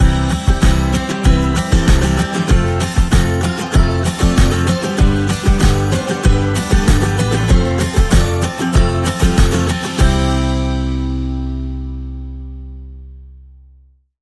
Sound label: Bumper